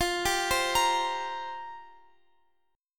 F Suspended 2nd Suspended 4th, down, Guitar, Open F; Fsus2sus4; arpeggiated